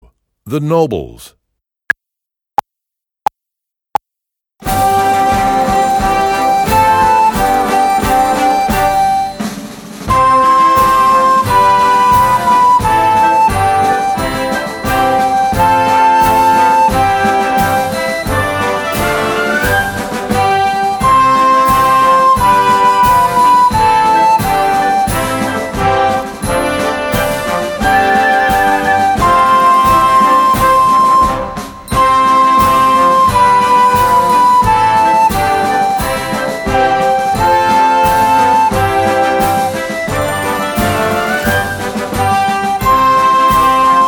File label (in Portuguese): The Nobles Com Flauta Guia; Nivel 3; 3.o Ano de Escolaridade; Obra Obrigatoria; 11o concurso de flauta de bisel municipio de redondo